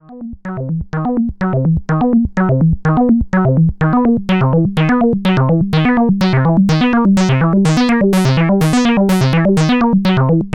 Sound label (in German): Pittsburgh KB 1 Sequenzer Noten und Presets Filter